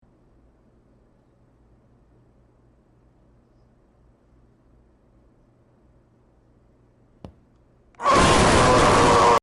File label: ooooohhhhh